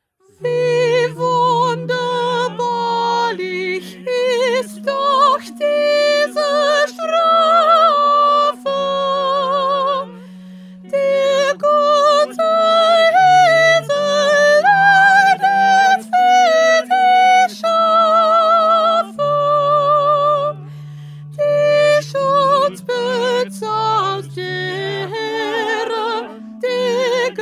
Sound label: Soprano